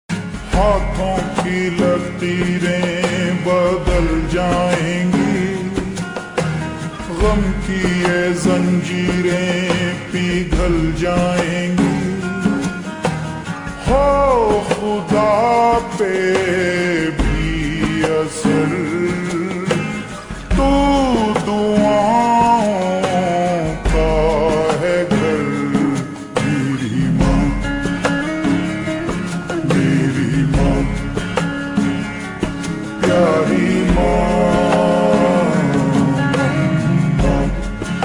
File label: Ringtone slowed reverb